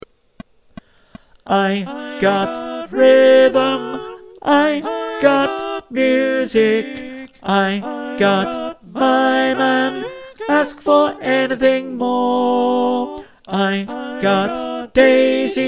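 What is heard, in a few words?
tenor, choir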